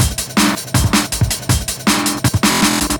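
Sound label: Glitched out Break, Drum Loops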